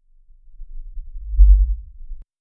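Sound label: isolated close range shift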